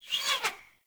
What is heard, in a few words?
Hunter Bow Projectile By; Weapons; Enemy; Bows